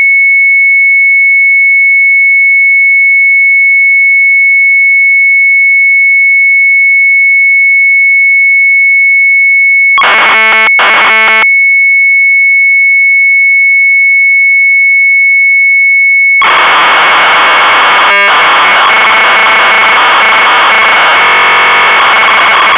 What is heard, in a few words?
MZ800 Tape, sharp; LIGHT CYCLE 2; retromelsarchive